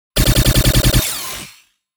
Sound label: Sci fi sidearm rapid fire